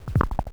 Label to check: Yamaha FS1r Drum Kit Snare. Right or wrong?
Wrong